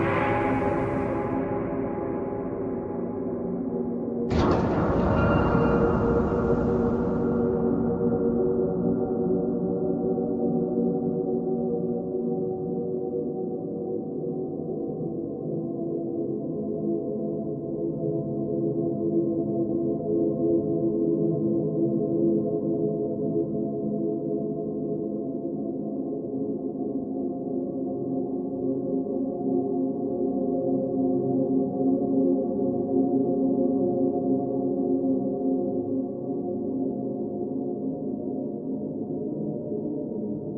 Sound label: loop; svencoop